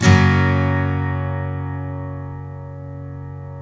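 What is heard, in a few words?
steel F# major up, CHORDS STEEL, Guitars; Spectrasonics; Hans Zimmer Guitars VOL-1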